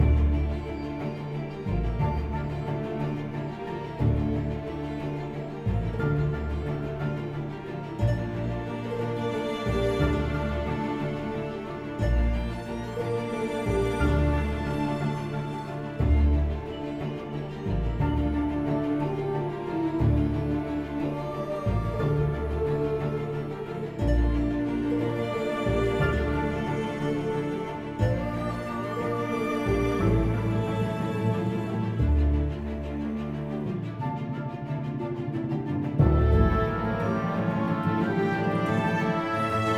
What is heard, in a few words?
battle marsh; Shadowhand; macos windows gamerip 2017